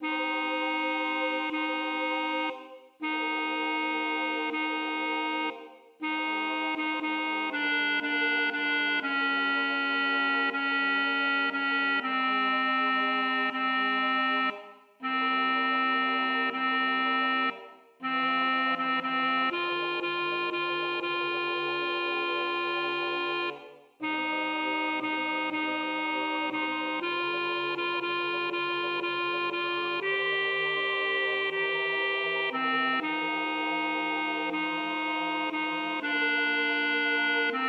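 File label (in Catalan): Contralts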